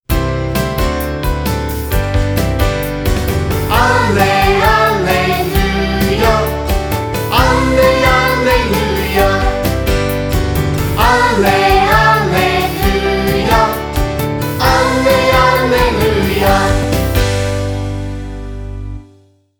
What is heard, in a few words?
Alleluia Gospel Acclamation; KidsLiturgy; Year A